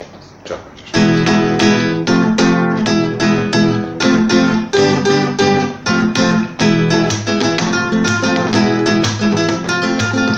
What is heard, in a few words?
Guitar